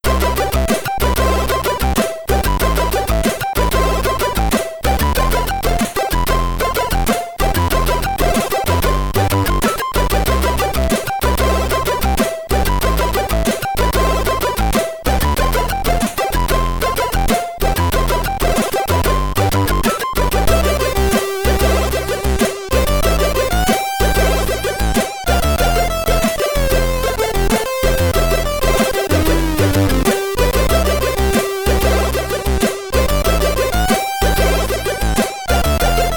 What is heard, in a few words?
Loading Tune; DNA Warrior; Commodore 64